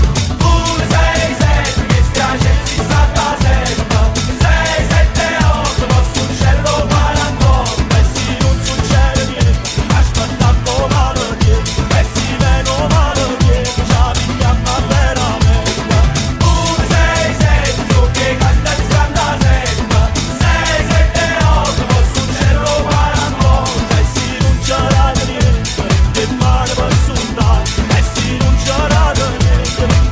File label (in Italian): remix canzoni napoletane